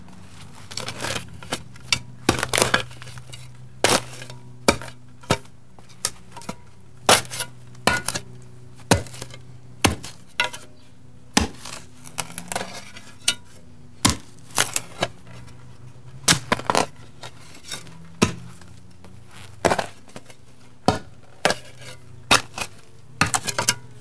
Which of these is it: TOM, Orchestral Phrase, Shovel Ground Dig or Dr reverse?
Shovel Ground Dig